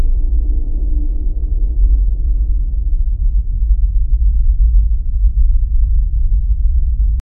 alien world atmosphere, apocalyptic

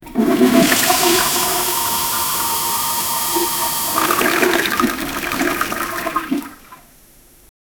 flush the high tech japan, bathroom

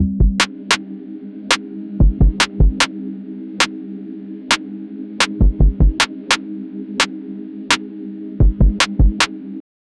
urban pulse drop trap

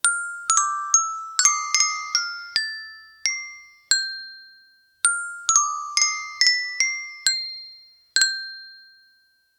wooden xylophone, musical instrument

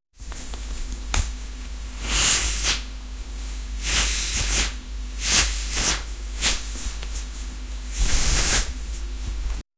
diarrhea in pants

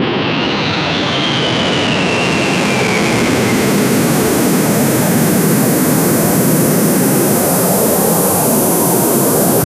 6th generation jet does a, weaponry

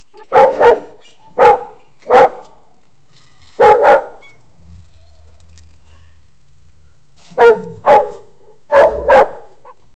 the big dogs powerful

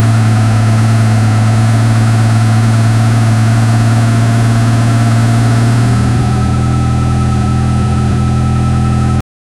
lamborghini aventador svj

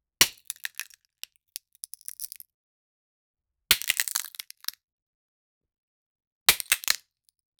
sound of break a toy, breaking

